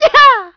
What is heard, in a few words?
jump; impact